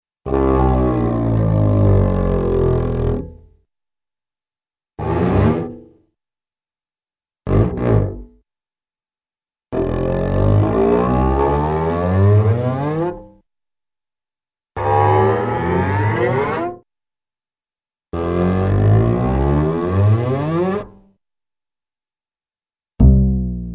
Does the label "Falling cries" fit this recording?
No